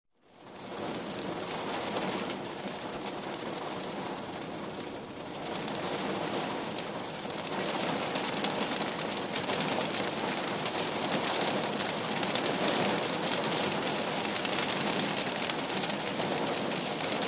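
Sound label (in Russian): хлещет в окно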